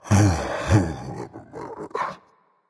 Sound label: grumble berserker; cuchulainn